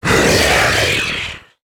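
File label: alert, zombie male; nmr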